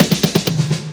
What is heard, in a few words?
Fills 128BPM, Clubsounds